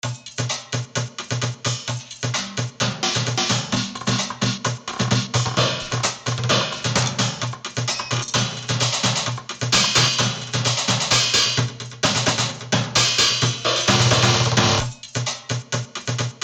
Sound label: Tin Drumline